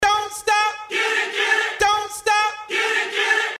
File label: LOOP 68BPM